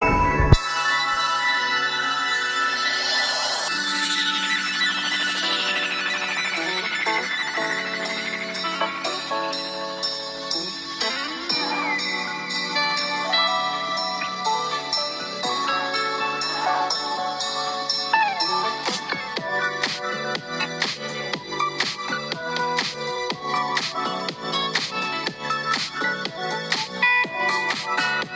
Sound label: funky groove